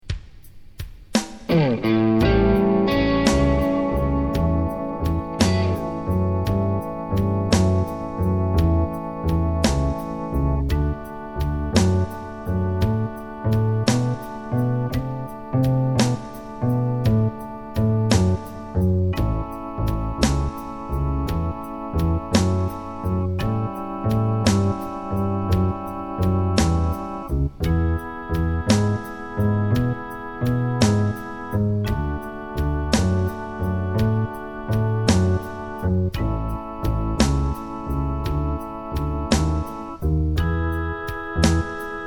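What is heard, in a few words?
blues jam backing track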